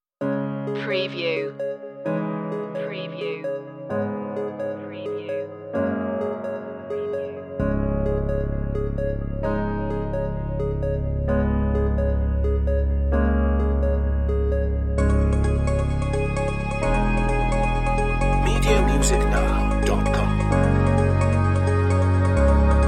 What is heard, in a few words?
no drums or sound fx; chillout grooves